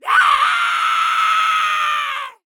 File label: Humans Female Scream; Screams Shouts 2; soundbits